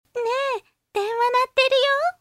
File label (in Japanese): denwa